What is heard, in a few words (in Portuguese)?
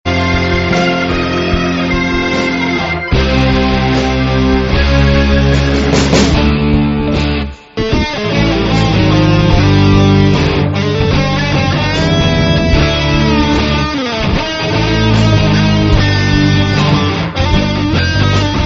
musica sample